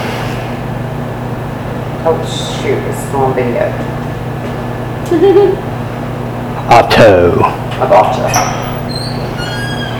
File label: Unexplained Loud Giggle AMPLIFIED